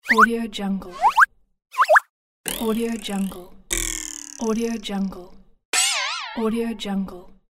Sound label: cartoon boing pack